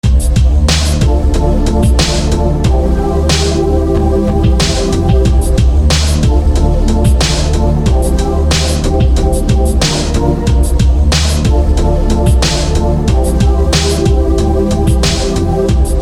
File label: Derrty Saw